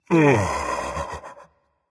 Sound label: content berserker; cuchulainn